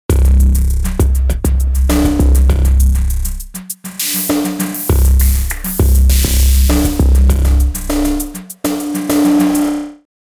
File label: tonal delay; sugar bytes; effectrix